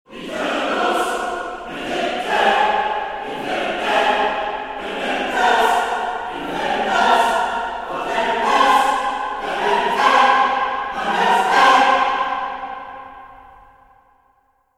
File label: Choir; Abbey Road